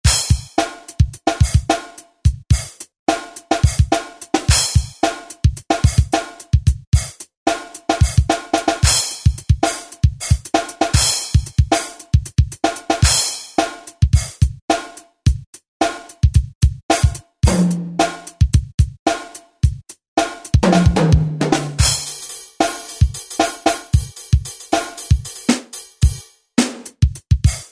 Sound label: funk kit; Dave's Funk Kit; Sonic Reality Modern Songwriter